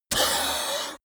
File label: yeti hiss weak; kreaturen